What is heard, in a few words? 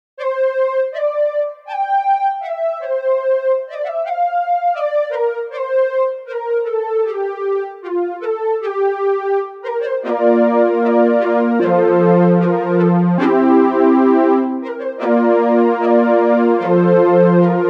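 OB-8 Filter Envelope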